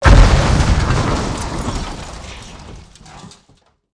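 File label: engine crash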